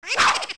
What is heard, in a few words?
Stalchild Attack; zelda; OOT